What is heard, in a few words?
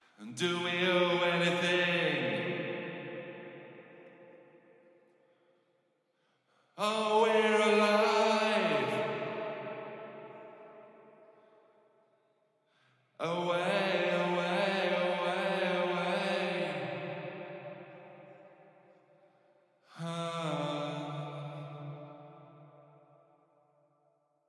vocals back